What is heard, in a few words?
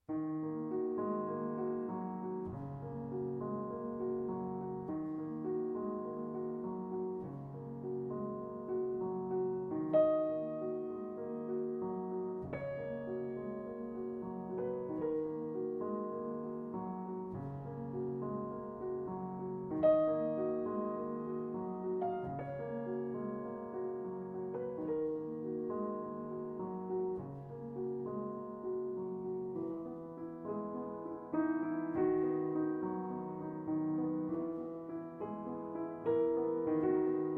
Solo Piano